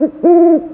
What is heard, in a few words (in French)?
Hibou